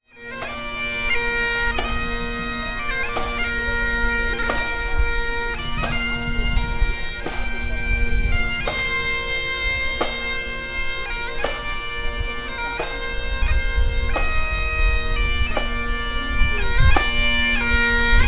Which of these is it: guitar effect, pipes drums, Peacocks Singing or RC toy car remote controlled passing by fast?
pipes drums